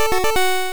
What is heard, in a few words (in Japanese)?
アイテムゲット